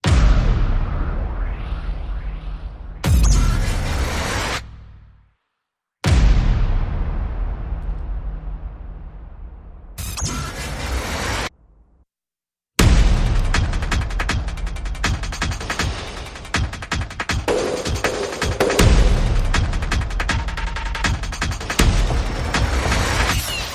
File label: Percussion and FX